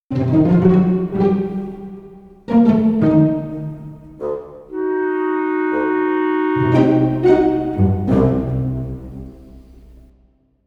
Duck; Comic Slow

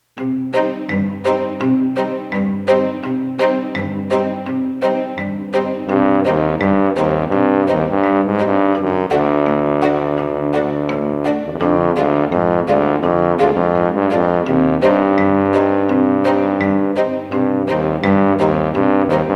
Cute Monster Tuba Ver; Comic 드라마

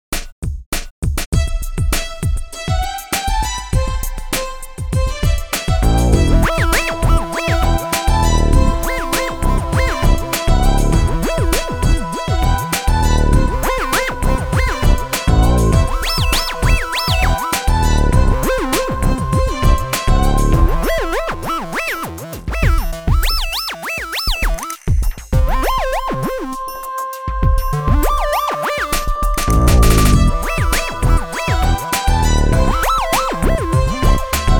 EDM 리듬

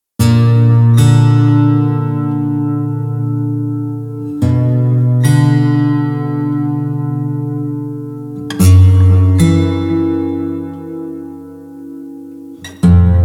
Tension Guitar

